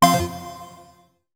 Retro Event Stereo UP

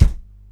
shoot, weapons

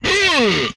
Attack, Vocal; retro arcade; MMRetroArcadeSoundsPack

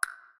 weird, sfx

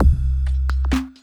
Kick Bass; BB 2HTC Samples Vol 4

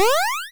powerup, Retro Sounds 32-Bit

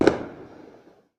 bang; CC0 bang sfx

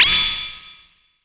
Retro Impact Metal

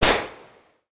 retro explosion; retro synth SFX

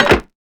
Door Lock; FREE SFX PACK VOL 3

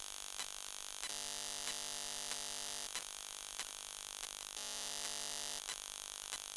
Electromagnetic Smartphone Idle Loop; Mono; Essentials Series NOX SOUND

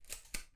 shotgun pump

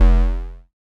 Allert Computer; FREE SFX PACK VOL 2

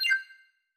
confirm, tones; inteface sfx pack 1

